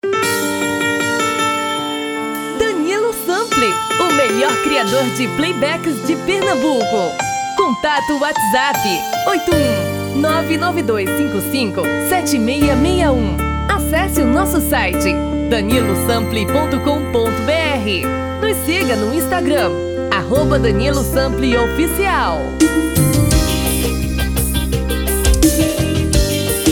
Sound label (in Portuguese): Axe